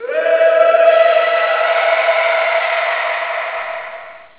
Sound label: yeti scream